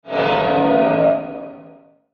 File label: Metallic Sweeper Dull Reverb; Metallic Sound; Blastwave